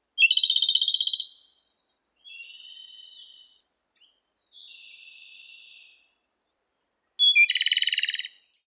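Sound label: Japanese robin song, birds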